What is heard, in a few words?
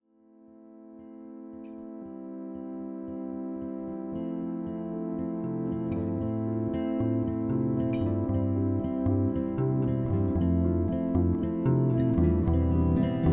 music car leaving; CampusA